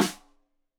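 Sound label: Snare; Deeply Sampled Drums; Stereo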